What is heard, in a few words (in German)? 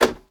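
blinker switch off, volvo 9900; Freyfurt Beta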